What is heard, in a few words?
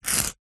office brick pen ball point score mark